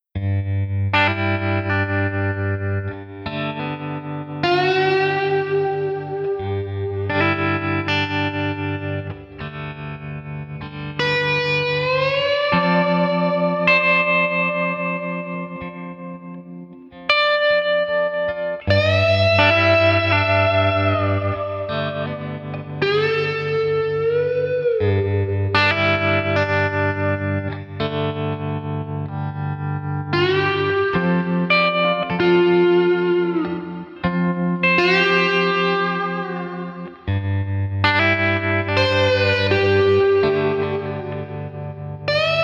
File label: Slow slide, guitars